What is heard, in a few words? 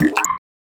Wet Reverse; FREE SFX PACK VOL 3